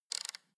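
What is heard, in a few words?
sports car interior volume button spin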